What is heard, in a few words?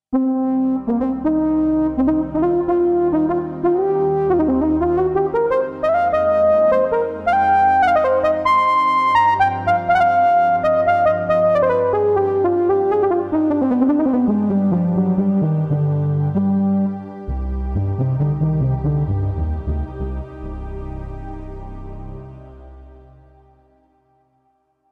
Behringer Kobol Soft Brass jd990